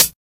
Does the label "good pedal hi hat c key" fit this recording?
Yes